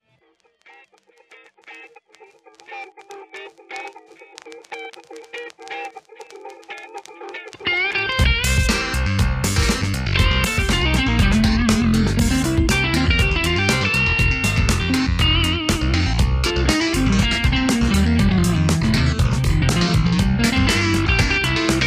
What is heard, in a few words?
Funky